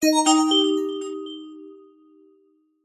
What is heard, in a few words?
multimedia bell chime alert synthesised positive